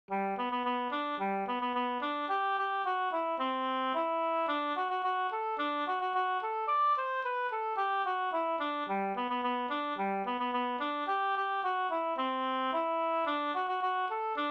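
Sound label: polka, G major; fr Roros no Gdur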